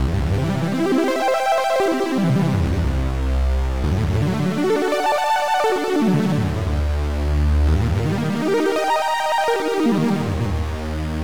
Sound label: octaves, mods; Gravis Ultrasound